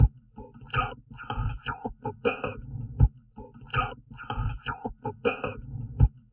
TALK, Drum Loops Crazy Processed

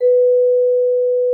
VIBP; Xsample VOL-4

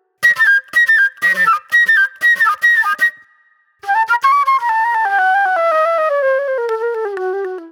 Super Soul Flute; Sonic Foundry (Sony Creative Software); Manhattan Flutes; The Funky Mama

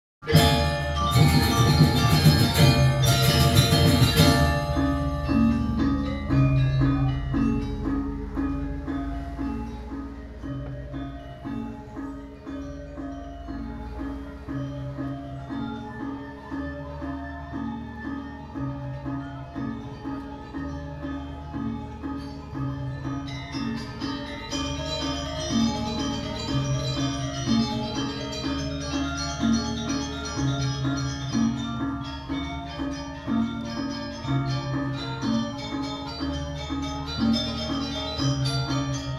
Gamelan; Propeller Island - Complete Gamelan

